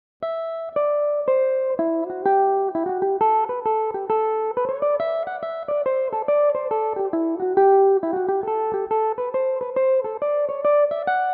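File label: hornpipe, A dorian, mandolin; Hawke's Hornpipe; (4/4); Belmuse EM-190 electric mandolin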